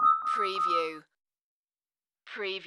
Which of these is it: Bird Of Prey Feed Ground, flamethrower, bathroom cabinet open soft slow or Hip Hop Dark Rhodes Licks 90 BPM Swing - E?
Hip Hop Dark Rhodes Licks 90 BPM Swing - E